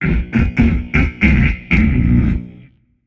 tongue, voicepack; smite; jorm

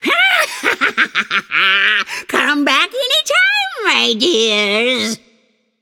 "yay"; babayaga

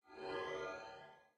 idle swish sickle; smite; osiris

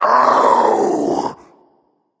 ouch; cerberus

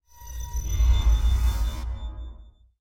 death ascend; smite; osiris

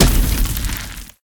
impact fire; smite; vulcan

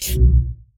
idle stroke; smite; god merlin

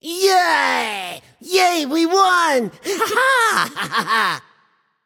yay; smite; raijin

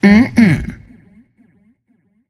tongue; smite; tiamat

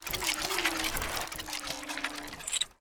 steampunk mvt landing; serqet; smite

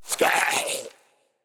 minion death grunt; smite; npc; roman archer

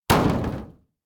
bulk metal hit; Smite; Cabrakan

